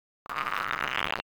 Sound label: glass